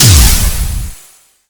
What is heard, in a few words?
stomping land; sonic